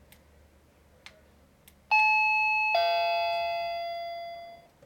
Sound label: Wireless Ding Dong